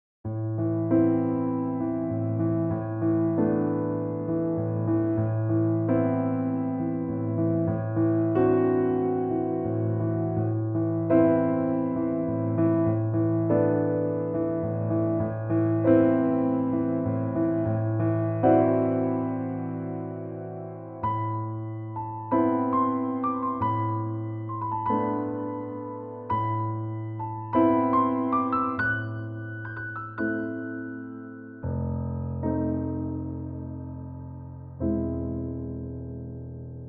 Rain In Forest, Piano; Final Fantasy Brave Exvius Piano Collections

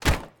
Weapon Wood Stick Hit; Pulling No Punches

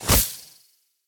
Charge Attack Release

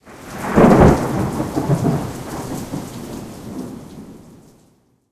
Ambient

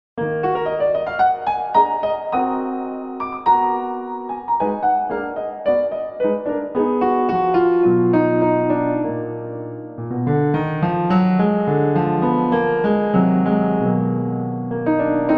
Piano Improvisation; Angels of Death; Angels of Slaughter

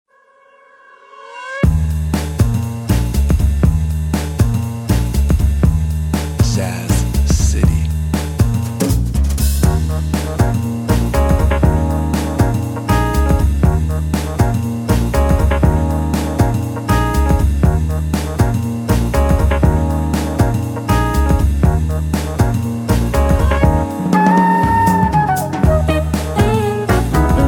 Jazz City

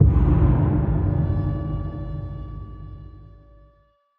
Stingers Sting Pain

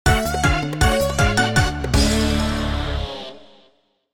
Music Loser

